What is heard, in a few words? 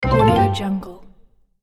super menu next